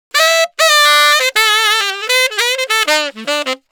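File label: 100 Acid Jazz sax A major; Brass; Digidesign; Pro Tools; Pro Tools Essential Loops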